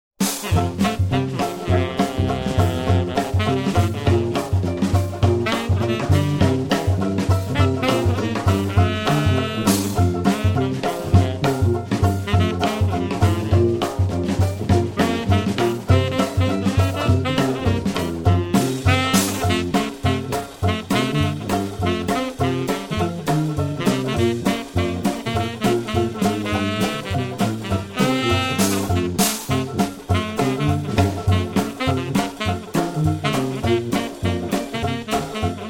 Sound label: jingle bells